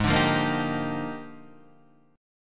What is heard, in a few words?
Bb 13th Ab